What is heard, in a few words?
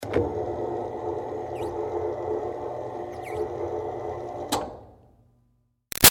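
Projector Screen Close Up Down Med; Offices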